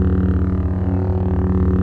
saber hum

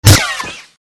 reflect, saber

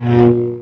saber spin

